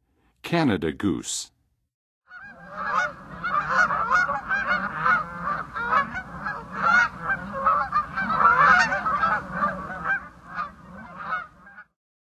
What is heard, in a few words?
Canada Goose; North America; Western USA; Western USA Songbirds